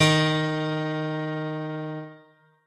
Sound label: piano